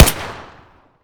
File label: tt fire outdoor close; Escape from Tarkov